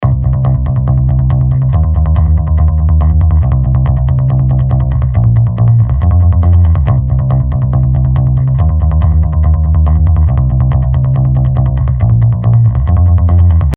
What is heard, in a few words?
Bass Trash In The Face